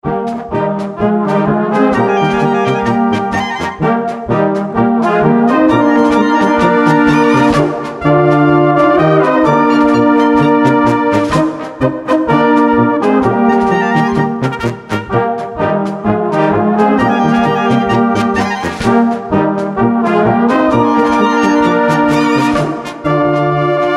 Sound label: Polka